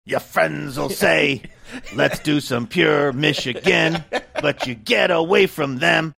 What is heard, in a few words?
singing ya friends will say lets do some pure michigan mcgruff crime dog